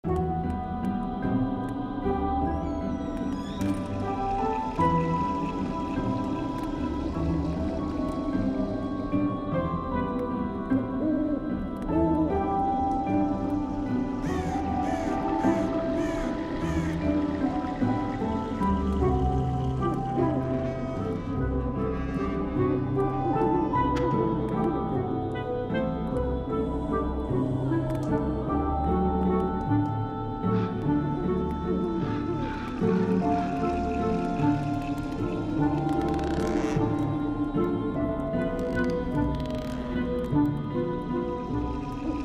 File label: Base Game Music; fire Wizard Desktop; Axial